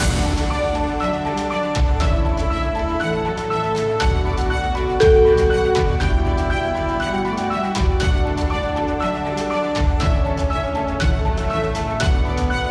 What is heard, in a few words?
big bright circle